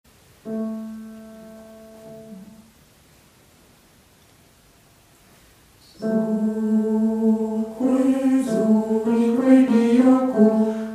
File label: Zo kwe zo Alto